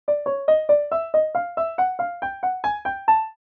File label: Cartoon sneaky